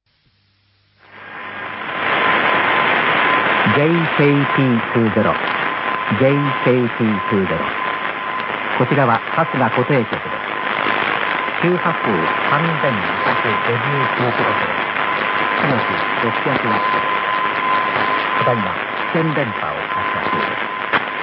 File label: 3259 kHz; 600w; JKP20 Fukuoka Kasuga